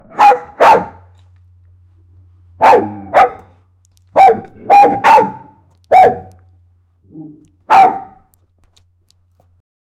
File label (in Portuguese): som de monstro com sons, bone crack